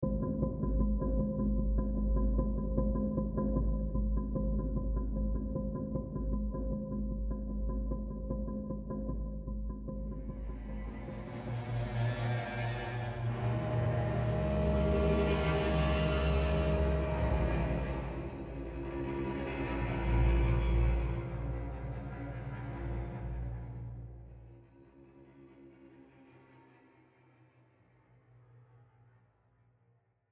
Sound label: Marimba; The Last of Us